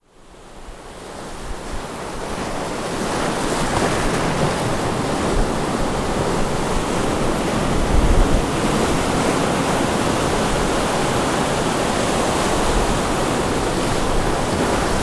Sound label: breaking waves at wash away beach